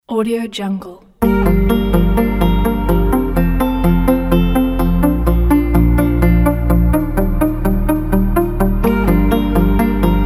optimistic corporate motivational technology

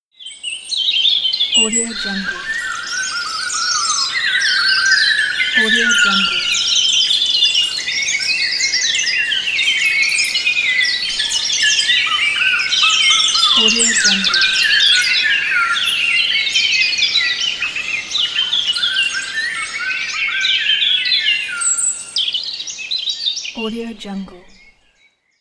birds

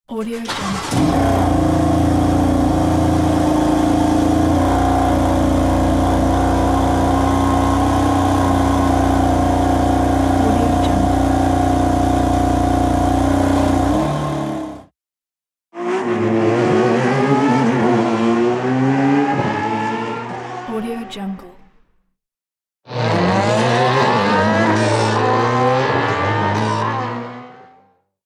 racing cars sound

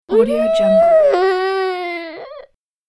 unhappy kid

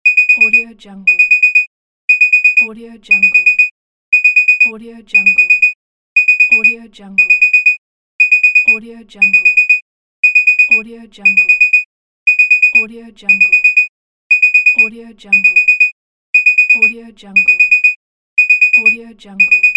alarm clock

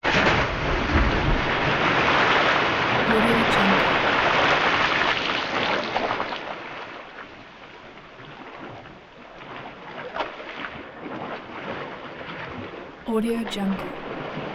water splash jumping in pool huge spray

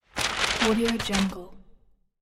paper wrapping handle rustle; blazznet

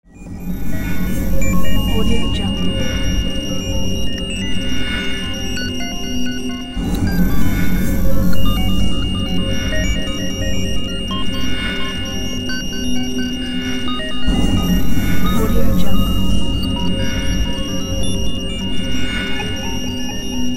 drone low electronic tone

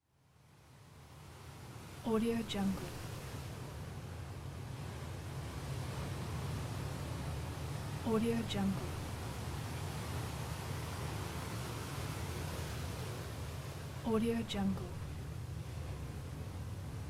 sea waves ambiance

